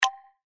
General Button; video poker

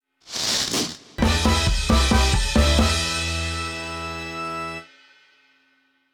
SFX Symbol Jackpot Win; FinWin

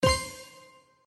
Spin Stop Win; Realm Saga

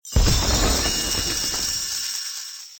Win Bonus; Realm Saga

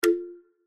btn Standard Denomination; slots; Pirate Quest

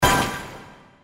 Reel Spin Stop Special; St Paddys Day Blast

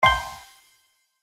Button Click; Steam Miners

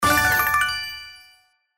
symbol jackpot win, slots; Wildlife Adventure

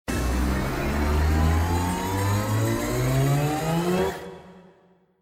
Bonus Anticipation; slots; Wealth Gods2

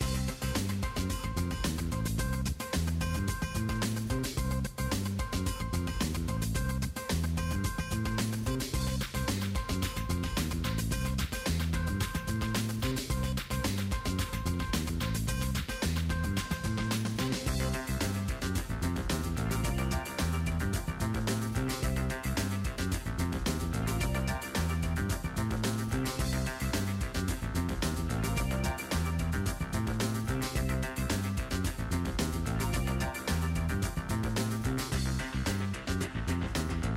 BGM Main UI; slots; Steam Miners

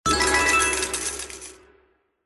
Symbol Scatter Win; slots; Ninpo Slash

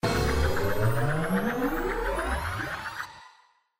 scatter anticipation; slots; Gem Fortunes

